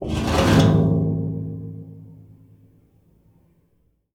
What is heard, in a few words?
Resonant Rusty Door scrape short